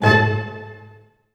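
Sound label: Staccato D#, Orchestra; ORCH STAC.-M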